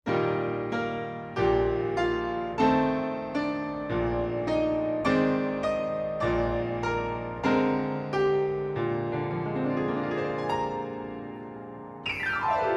The Lightning Trumpet

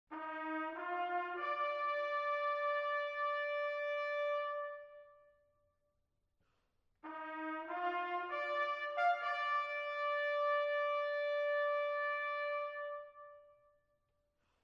Symphony In E Flat For Wind Orchestra, Fanfare

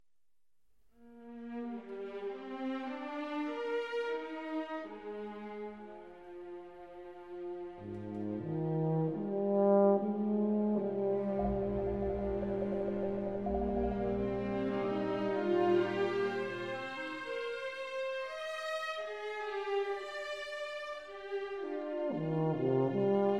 Portraits For Tuba and Chamber Orchestra; Mvt. 2 Portait II

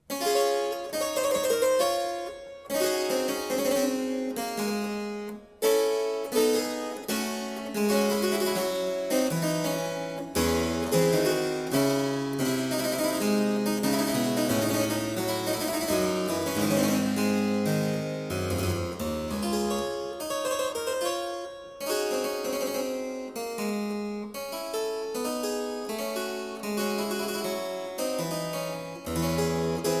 Suite I in D minor Sarabande, Harpsichord; Music for Harpsichord

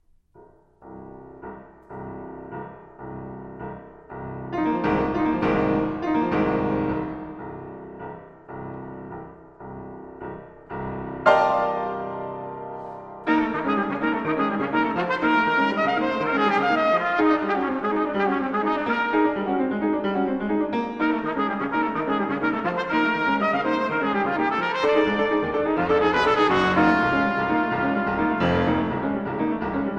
Sonata for Trumpet and Piano, Allegro

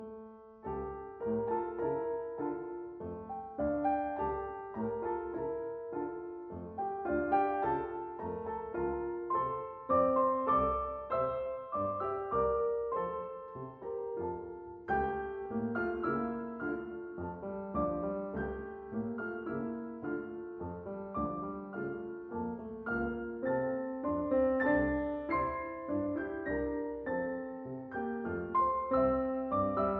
Waltzes, For Piano, four Hands, D Minor; Brahms

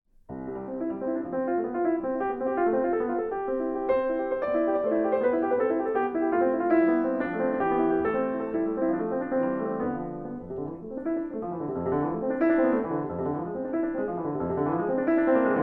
Little Suite for Piano; Keyboard Classics for Children; I. Doctor Gradus ad Parnasum

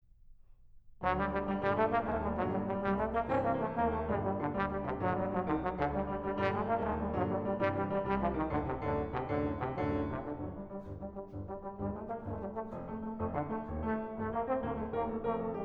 Sonata for Trombone and Piano; 3. Below Surface

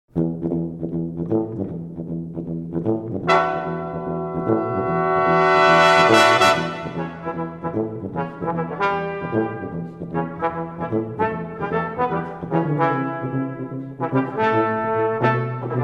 Blues, Brass; Boston Brass; Backyard Blues

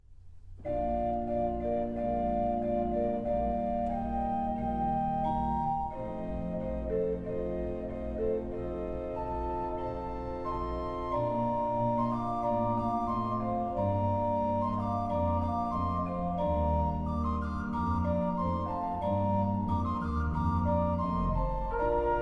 Trumpet; Sheep May Safely Graze from Cantata, BWV 208

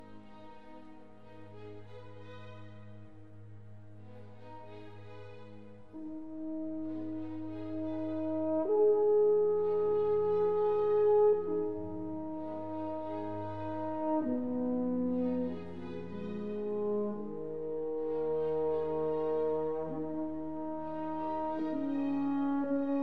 Horn Concerto, Andante; Strauss Concertos

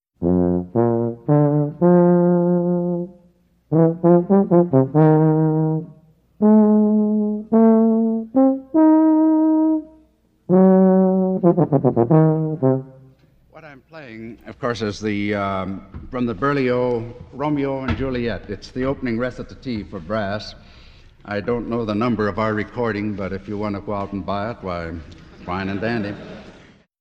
F tuba demonstration; Berlioz

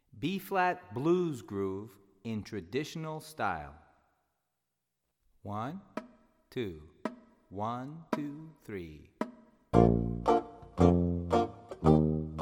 Bb Blues Groove

